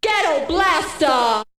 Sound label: Vocal; ZERO G - Datafile DISC 1